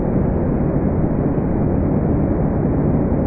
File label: Tech amb